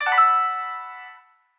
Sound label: Piano, notifications; HTC Rhyme